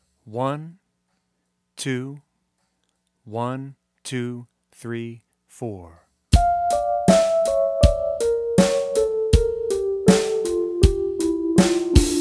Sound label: D Minor Etude no bass